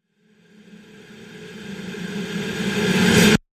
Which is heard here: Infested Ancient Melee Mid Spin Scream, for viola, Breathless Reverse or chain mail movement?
Breathless Reverse